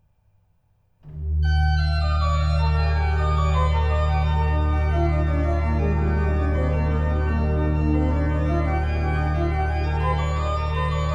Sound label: Praeludium et Fuga, Organ; Organ Music from Bohemia; Ariadne Musica Praeludium et Fuga IV (J. C. F. Fischer)